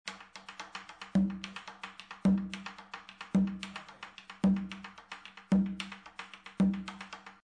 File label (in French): congo laye caja variante